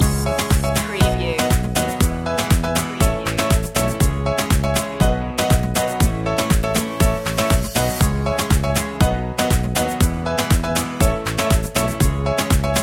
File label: guitar; media music now